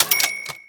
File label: count up sfx